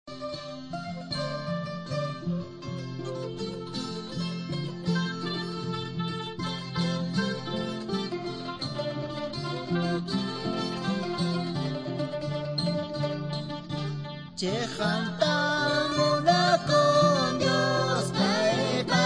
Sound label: songs quechua cuzco caylloma